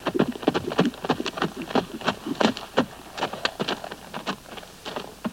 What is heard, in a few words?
Horse Gallop Trot Stu